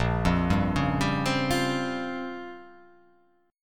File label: A sharp Minor 6th Add 9th A sharp down, chord